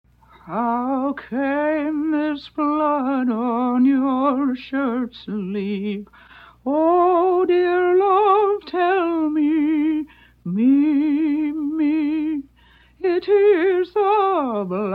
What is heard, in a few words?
Ballad Singing